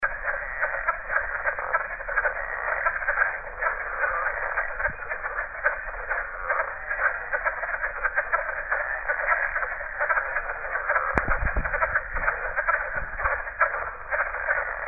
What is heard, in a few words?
atlantic coast leopard frog